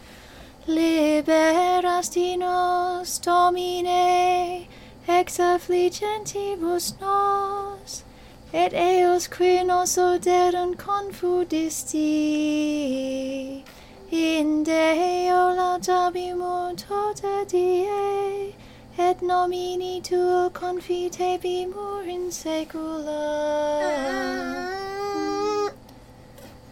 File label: psalm toned gradual